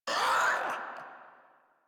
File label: Infested Crawler Hear Sound; Warframe